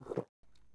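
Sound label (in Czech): otocil klich; Mafie 1 cz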